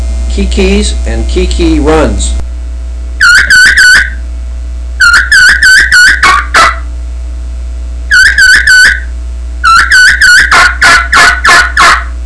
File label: daybreak challenger kee kee kee kee run, turkey; Southland Sounds